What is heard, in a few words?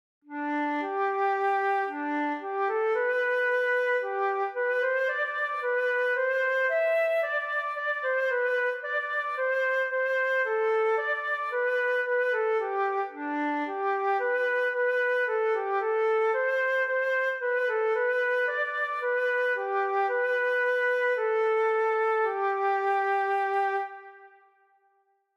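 flute solo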